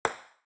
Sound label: ping-pong ball, clattering